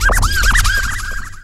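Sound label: scratch, record; XP RECORD-FX; Best Service; XXL Production Effects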